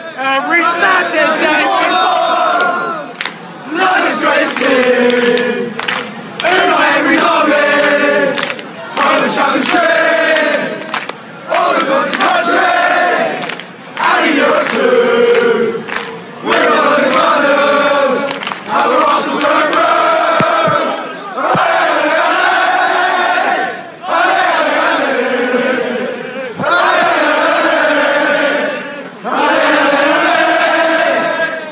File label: arsenal allez allez allez chant